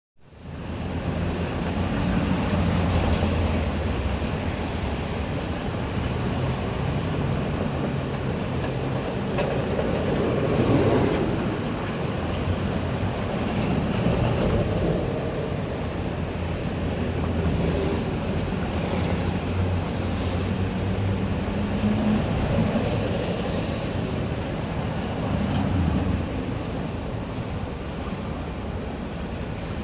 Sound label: New York: Broadway traffic, mid-morning; City; America; BBC